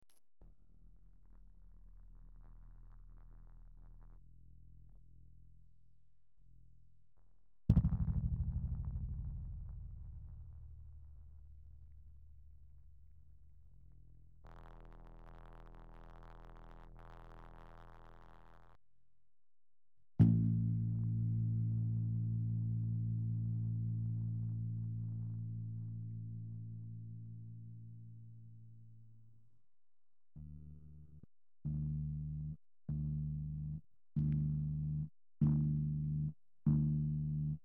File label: bass